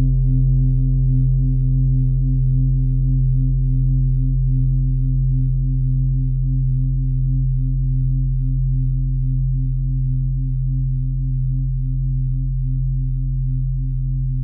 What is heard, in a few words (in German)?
fuss klangschale